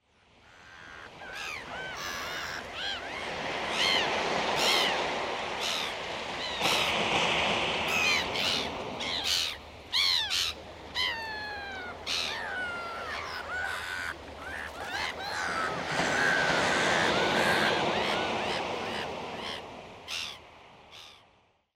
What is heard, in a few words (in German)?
Meer